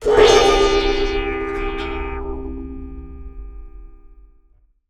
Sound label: Moog LPF & Delay, contact mic, Wire & Tin